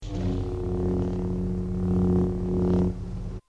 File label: Hum no move; WT588D; Arduino; SabrSound2